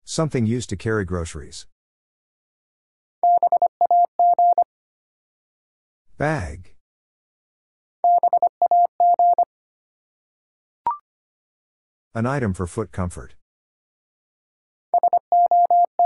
Easy Words with Hints - 25wpm, morse code practice